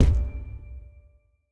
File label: reel Stop; fairyred